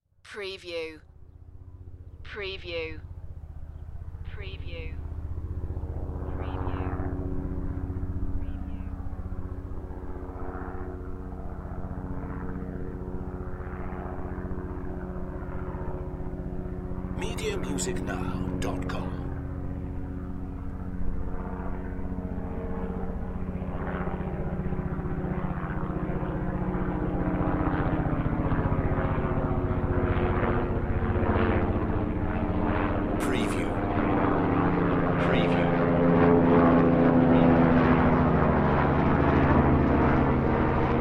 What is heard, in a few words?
Twin Propeller Airplane Passing Overhead